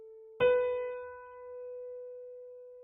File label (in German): Ton, Klavier einzelne Toene